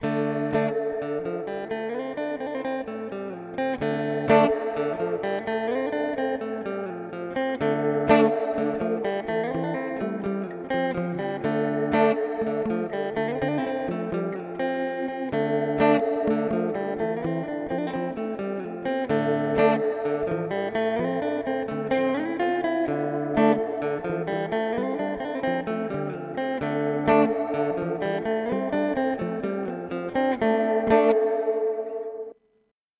Plate; Walrus Audio R1

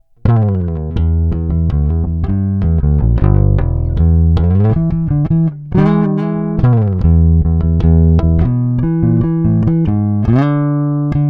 P Bass Finger Rock Line

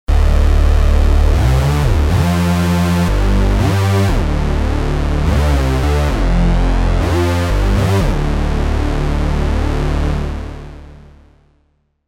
Juno 60 Hoover Attack

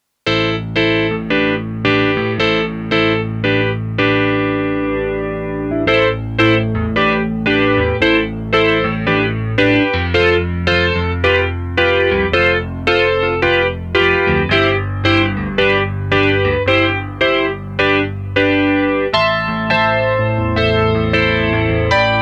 Yamaha SPX90 Chorus E Grand

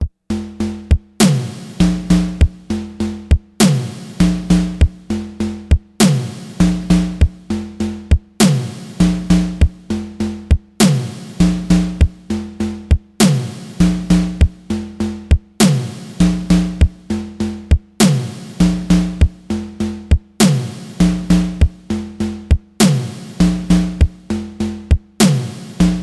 Simmons SDS 1000; Couldnt Resist

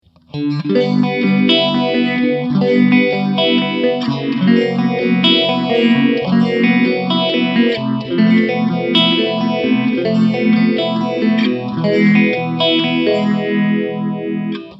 phaser

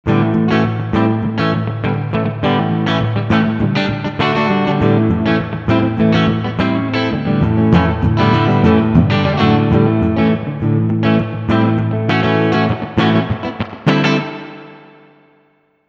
60s Reverb

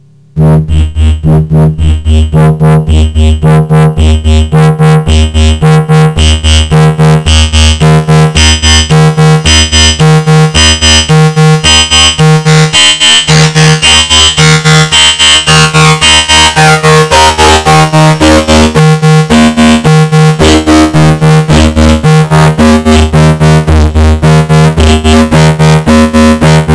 AnamonoXmini VCF Slow Attack via CV Out; Gotharman

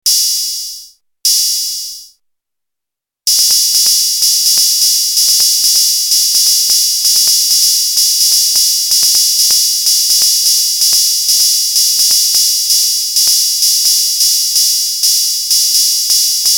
EMW Digi Drum cymbal click

